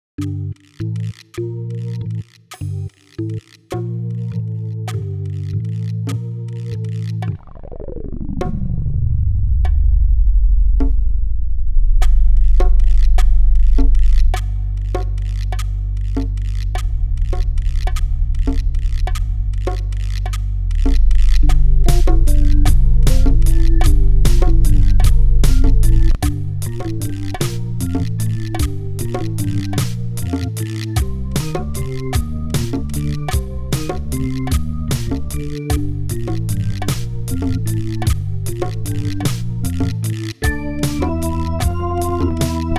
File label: MIDI ditty